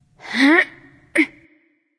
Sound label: grunt lift; Smite; artio